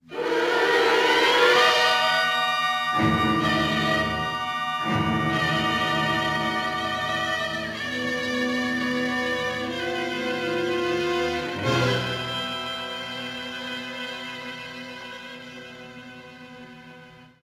Suspense, music; Star Trek TOS

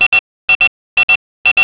pager; Pager 2

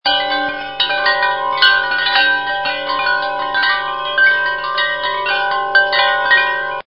windchimes, wind

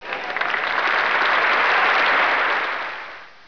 applause moderate

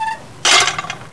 gate close

